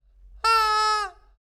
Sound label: TOYS Squeaky Chicken, Noise, One Long Squeal, High; Articulated Sounds; Squeaky Chicken Toys Vocalizations